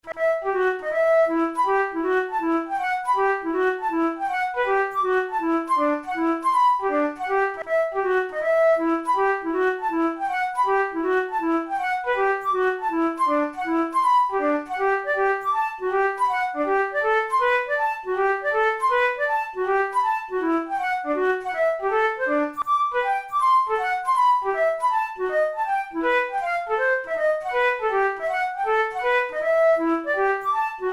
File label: braun pieces sans basse no18 bizaria, flute